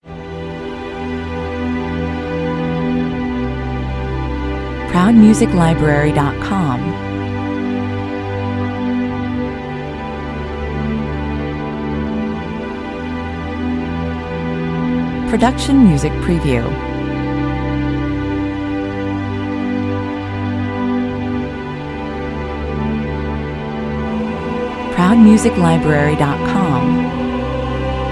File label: strings and choir only